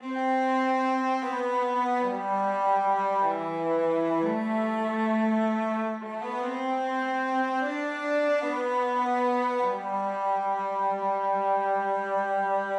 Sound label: Cello Main